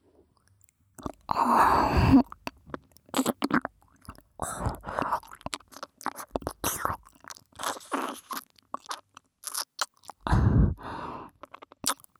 耳舐め 左; えろごろ！

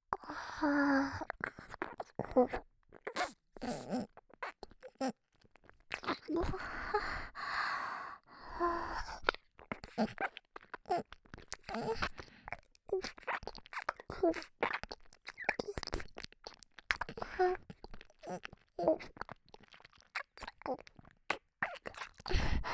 かぷっ…くちゅ…, 左耳, ASMR

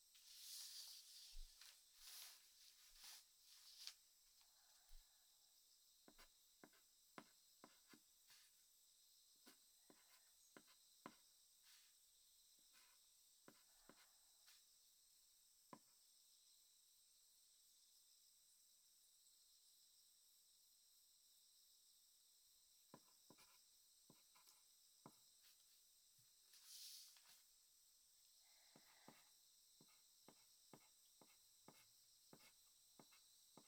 日記の執筆（ループ、スキップ可。作業用）

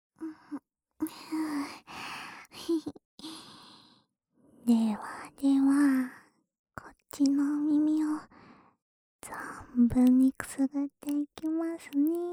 反対側のお耳も……ふーふー、ふーふー

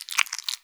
深めにストローク, ピストン運動 激しめ; １ストローク単発

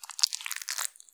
挿入or引き抜かれ終わる直前 膣内射精後or興奮時; 挿入or引き抜く

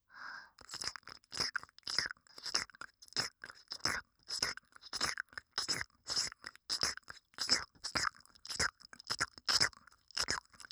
耳舐めonly右

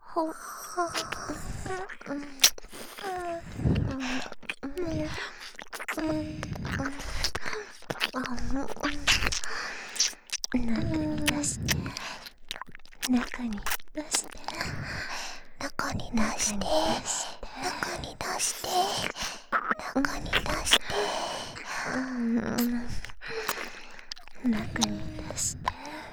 耳舐め; ハーレムオナサポ 4人のメイドがご主人様のオナニーをお手伝いするバイノーラル～文、千曜、名月、莉桜～

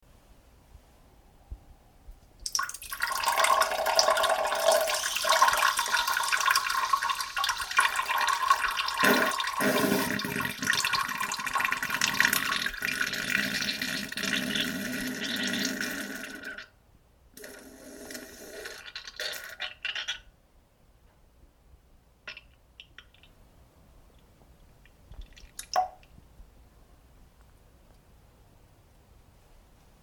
げりおなら おしっこと同時にげりおなら ぽちゃ音 なかなか出ないで間が長い

